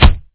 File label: block; Ragdoll Masters; dos games